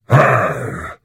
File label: Hit; The Elder Scrolls III - Morrowind